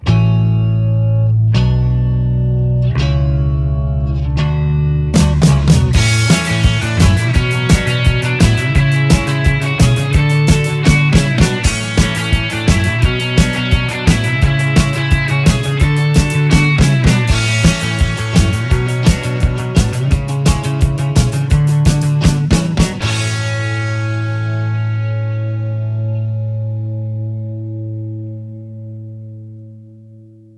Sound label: MUSIC